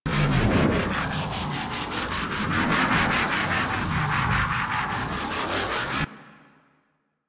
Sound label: Stone Gaze Loop; Warframe; Brawler